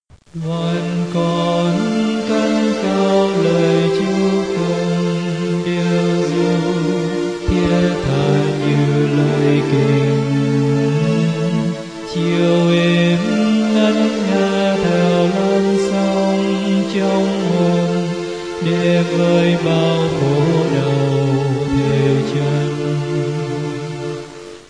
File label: alto